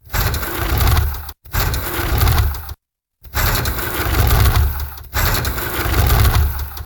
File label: battle mech walks fast slow sequence; Impacts; battle mech heavy